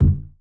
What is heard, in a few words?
Bouncy Bubble Bounce; Subway Surfers City Sound Effects; Android